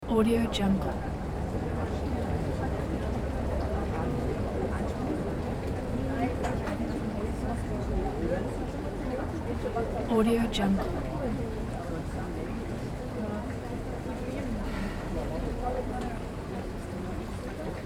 town main square restaurant movement fountain cafe; urban sounds